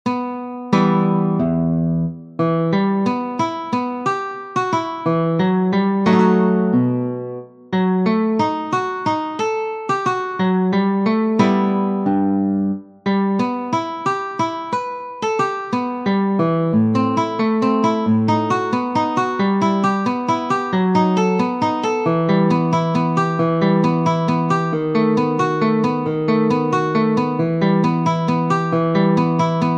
Moderato, guitar

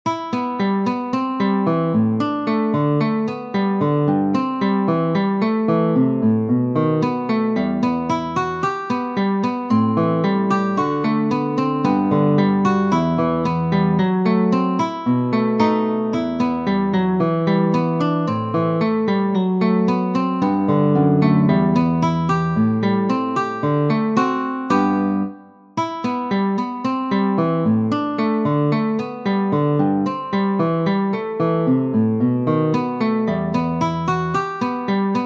prelude, guitar

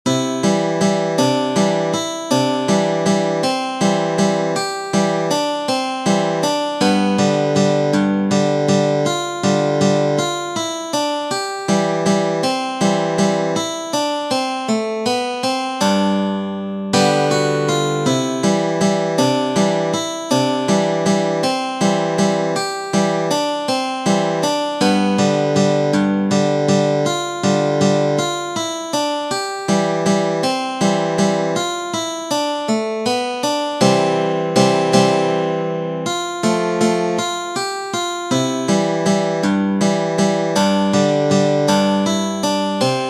Waltz, guitar

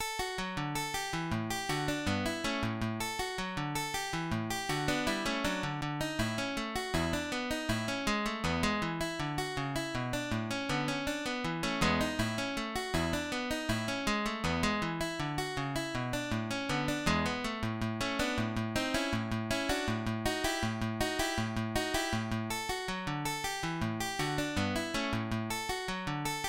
Allegro spiritoso, guitar; 6 rondos

